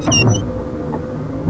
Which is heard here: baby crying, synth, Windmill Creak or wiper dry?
wiper dry